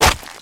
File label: splat